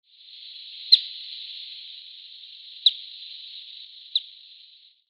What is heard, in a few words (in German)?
Wermutregenpfeifer Charadrius asiaticus, Vogelstimmen